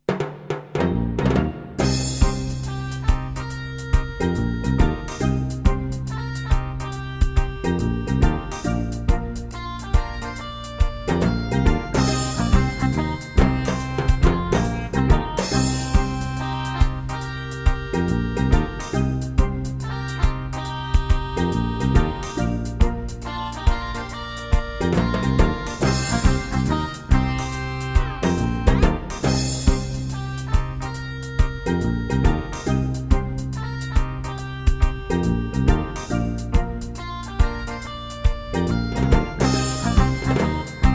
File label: EMU SoundEngine; Eco Quest